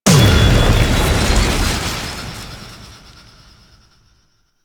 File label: Plunge Ability Shockwave; Enemies; Abilities; Warframe; Duviri; Dax Knight